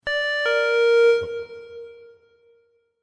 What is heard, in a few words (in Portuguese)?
sinal no aeroporto